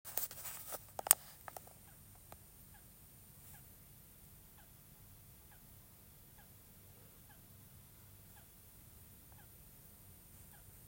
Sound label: Noise from wall